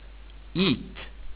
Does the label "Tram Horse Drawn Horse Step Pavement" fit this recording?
No